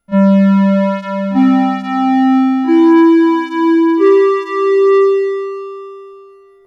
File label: Chime; Bosch; Chimes and Announcements